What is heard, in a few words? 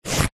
office pen ballpoint draw line on tissue paper